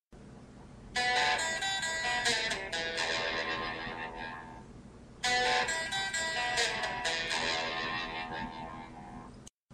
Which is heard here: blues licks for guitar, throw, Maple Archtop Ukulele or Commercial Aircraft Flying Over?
blues licks for guitar